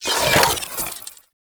Rifle Fire Stop, Weapons; Warframe; Tenno; Gyre

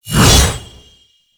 Hammer Glass Whoosh

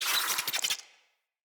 Infested Ashen Vocal Pain

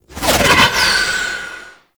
Archgun Proj By; Warframe; Weapons; Archwing; Tn Nokko Archgun

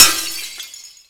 Glass Impact; Warframe

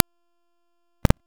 Thermal Shock Ring; Warframe; Runner

